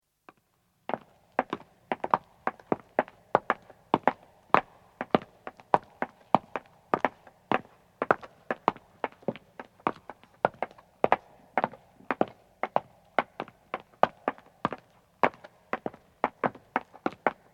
Footstep stone, 1 man 1 woman start and stop; BBC

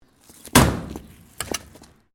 debris; Material components for constructing more complex sounds; Materials

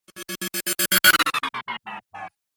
Reverse Cymbal Whoosh

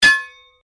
Strike Metal On Metal Heavy Ring; Foley sounds for melee weapons; Metal (Sound Effects:Materials)

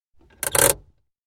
Car, interior, handbrake applied; Car Sound Effects; Cars (Sound Effects:Vehicles); BBC

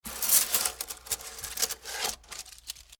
Wood Debris Foley Small Pieces Pick Up Off Cement; Materials

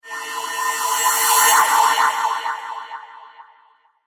Reverse Cymbal Phaser

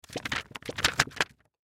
Note Pad Shake Fast; Paper; Office; Blastwave

